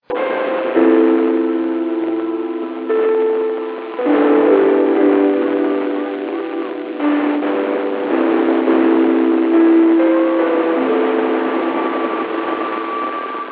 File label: 6m; CE Muzak 47.900; N1BUG